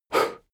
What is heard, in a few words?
male breath fast out